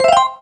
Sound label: enter; VirtuaTennis